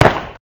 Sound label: pop, Kart